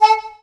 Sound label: SAX, Drum & Bass, 160 BPM; Ueberschall; Drum & Bass Resonance